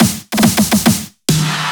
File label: Fill In; VDUB2 Fill In 004